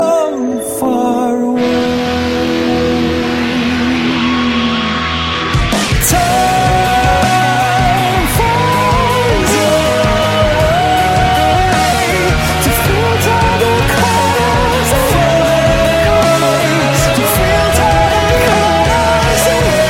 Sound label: Tv Jingle